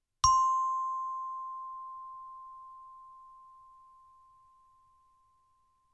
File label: Chimebar C